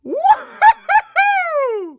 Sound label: "WOHOOOO"